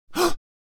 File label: Frightened man gasp of fear